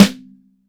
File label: MIX SN, DRY STANDARD SNARE